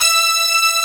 BRS E4, BRASS SECTION; AKAI S6000 CD-ROM - Volume 1; BrassWind

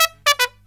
TRUMP, Killer Brass Riffs

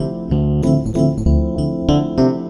Piano Breakdown, Drum N Bass, Keys Pads; Sonic Foundry (Sony Creative Software); Knutrix Drum & Bass

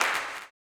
CLAP, blues & soul; ILIO; Vocal Planet

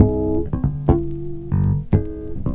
Bass FX

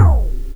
MISC PERC; E-MU Producer Series Vol. 8 – Platinum Phatt

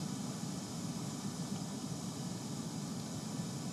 Ambience Loop, outdoor

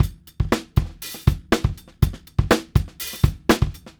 Live Beats, R&B Drums

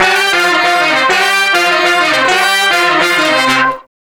Horn Sect Effect

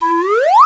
SLIDE W, SLIDE WHISTL

